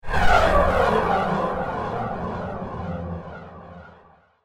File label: Space Torpedoes Deployed, science fiction sound effect